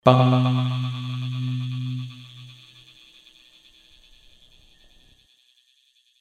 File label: deelay verby